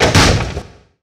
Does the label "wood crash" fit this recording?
Yes